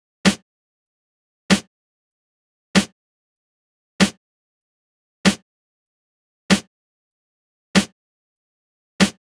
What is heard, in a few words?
Snare, Drum Tracks, Amin; Hip Hop; Big Fish Audio; Hip Hop Exotica